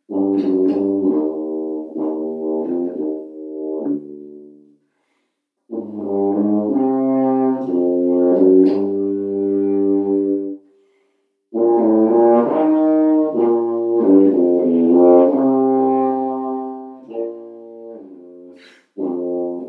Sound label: Tuba; 8th grade All-State